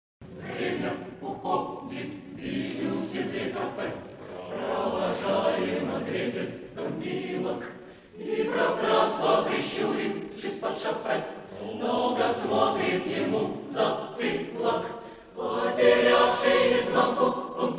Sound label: chorus; kultur